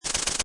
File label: Cartoon Fast Shaker, Rapid shaking, swirling, ratchet sound; Comedy/Cartoon; Funny Sounds